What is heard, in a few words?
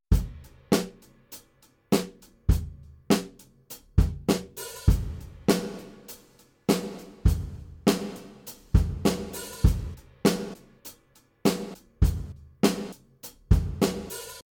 gated reverb drums